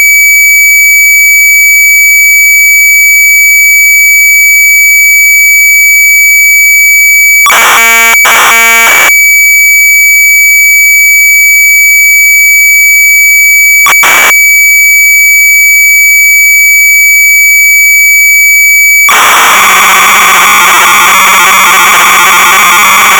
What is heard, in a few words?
MINI ORGAN